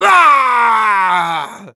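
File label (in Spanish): Sniper pain critical death, es; *Grito de muerte*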